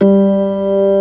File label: ORG.3 G3; B3 FULL